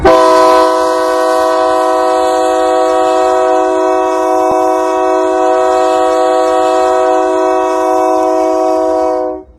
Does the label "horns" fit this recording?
Yes